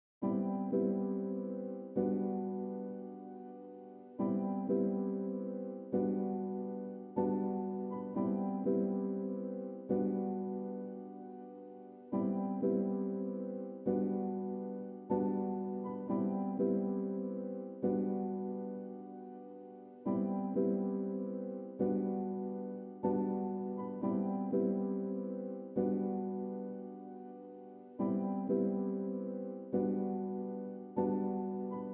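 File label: Piano 121BPM C major Warm Positive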